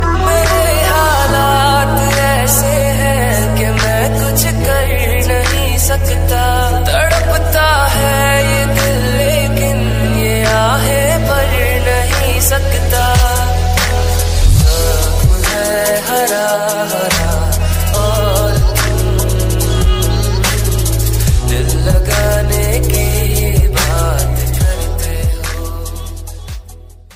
hindi ringtone, bollywood ringtones

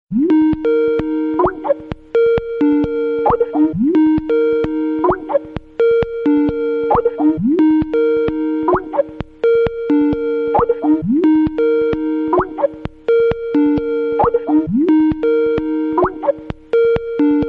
lovely skype call musical tone ringtone; samsung ringtones

